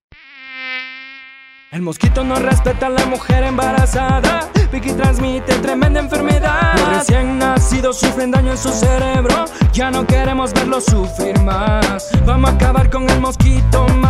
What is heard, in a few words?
JINGLE